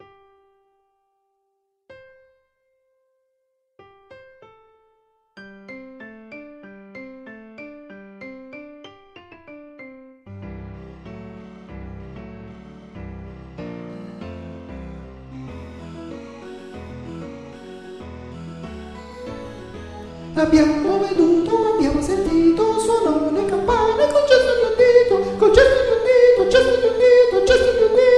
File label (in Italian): Concerto per prete e campane Soprani I